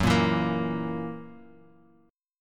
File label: E Suspended 2nd Flat 5th F sharp